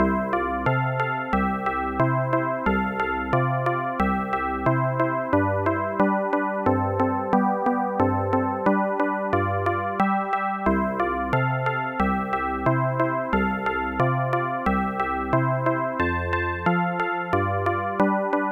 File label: THE BELLS